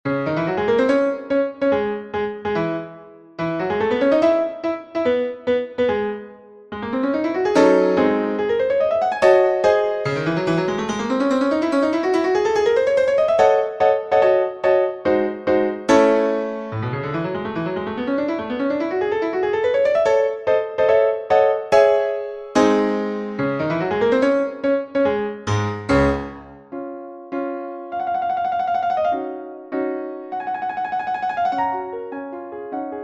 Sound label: etude no 19 in c minor piano